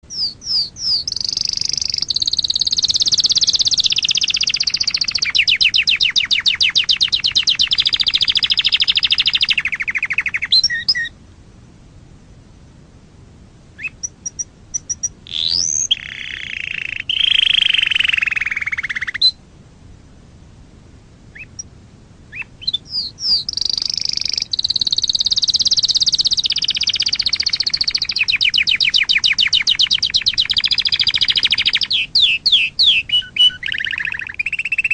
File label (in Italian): Canto degli uccelli